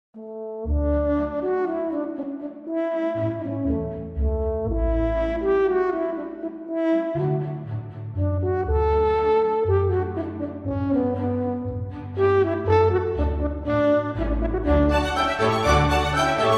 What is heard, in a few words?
Horn Concerto in D, Allegro, FHorn; Mozart